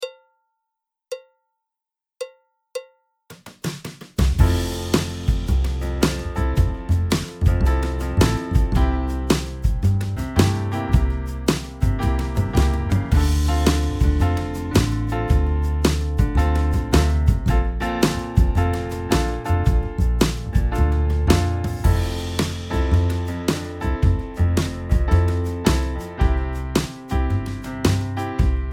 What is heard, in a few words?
Chord Key of F